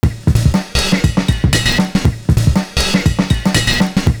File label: SIGNAL BEAT; Univers Sons